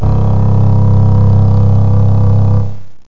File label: cello, strings; Sounds Terrific II (1996); Weird Science